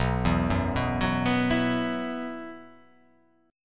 B Suspended 4th B down, chord; Bsus4 arpeggiated; JGuitar

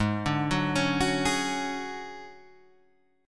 D Major 7th Flat 5th G sharp, chord

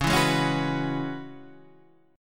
Db Minor 11th Db down Guitar Standard; Dbm11 strummed; JGuitar

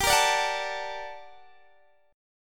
G Minor Major 9th, Guitar, DADGAD; chordshape; down

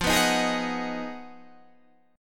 B 6th F, guitar chord; B6/F; strummed

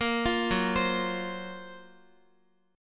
Gb Major Sharp 11th, Guitar; GbM#11 arpeggiated

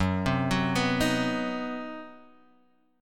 C Minor Major 7th Flat 5th Gb, chord

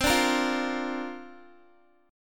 C 7th Suspended 2nd Suspended 4th C down Guitar; C7sus2sus4 strummed

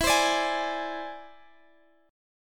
E Augmented 7th E down Guitar Standard; strummed

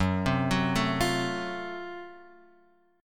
F sharp Minor Major 7th Flat 5th; chordshape; down

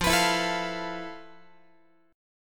F Major 7th Flat 5th, Guitar; strummed

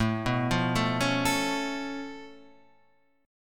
Db Augmented 7th A

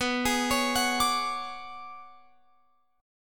B Minor 6th Add 9th B, Guitar Open F; Bm6add9 arpeggiated

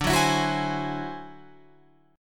D 9th Flat 5th D down Guitar Open F; strummed; JGuitar

